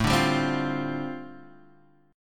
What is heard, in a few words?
G 7th Suspended 2nd Suspended 4th A chord